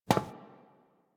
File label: NPC Step Default, Footsteps; Warframe; Friendly NPC